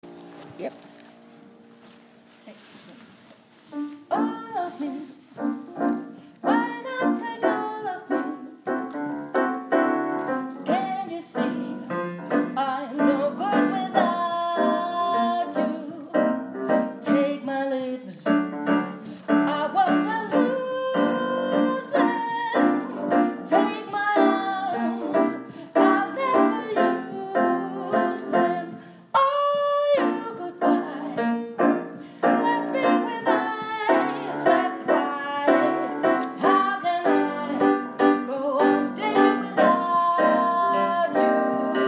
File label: sopran